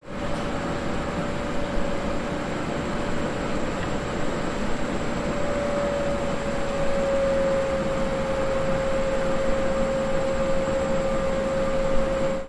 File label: monorail stop